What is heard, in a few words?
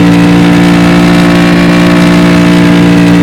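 shelby off high,car engine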